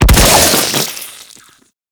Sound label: Modular Secondary Barrel Explosion; SU Modular Guns; Barrels